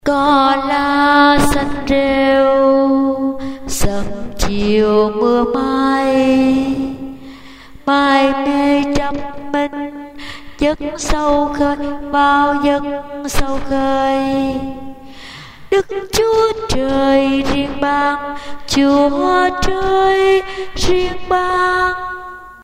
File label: basso